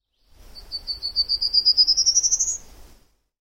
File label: prairie warbler